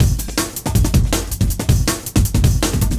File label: Pitched Beat, Breakbeats